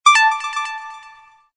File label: alien ding dong ringtone